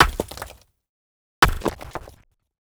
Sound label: Stone Break; Resynth